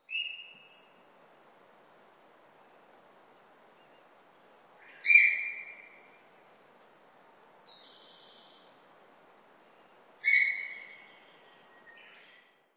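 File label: sika deer, chichibu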